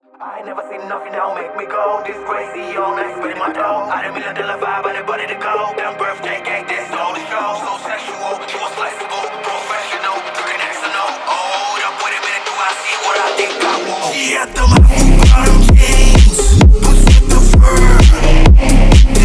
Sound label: PHONK COVER